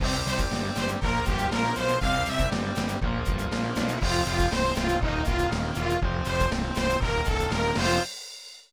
Trophy Win; mario tennis; n64

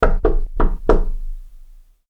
door knock

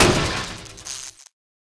Kick Electric Cabinet Impact; GPE Clusters Electric Cabinet Impact

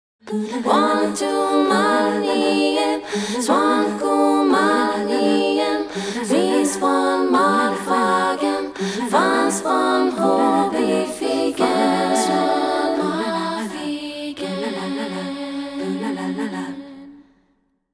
Acapella Version

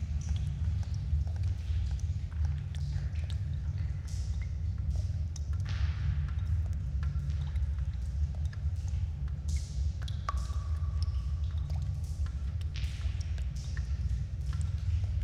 Underground Mine

